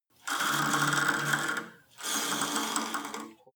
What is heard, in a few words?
cable machine old pull release, fitnessstudio; sport freizeit